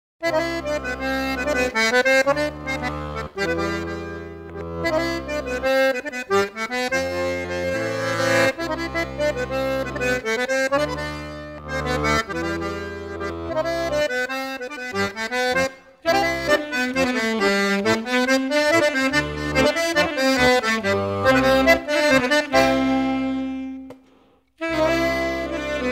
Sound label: Gavotte Bal; Bro Dreger; Suite Gavotte - Tamm kreiz